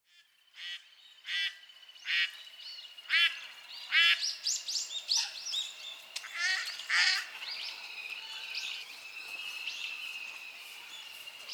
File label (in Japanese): 東京都武蔵野市の井の頭公園の池の周りでのカイツブリ・鴨の声です。; 2025年3月に東京都武蔵野市の井の頭公園の池の周りでのカイツブリ・鴨の声です。; 野鳥の声